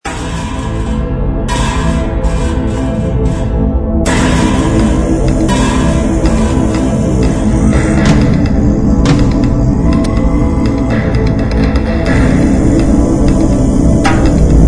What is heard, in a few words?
Tibet music samples; RPG